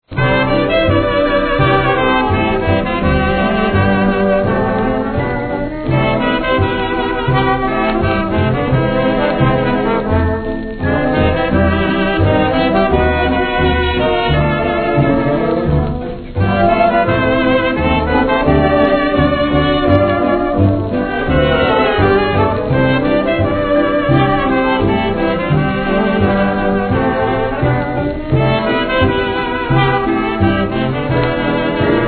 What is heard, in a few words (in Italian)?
Orchestra Jazz Sinfonico